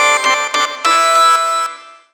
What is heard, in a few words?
ORGAN SYN; USB Soundscan vol.41; AKAI; Roots Funk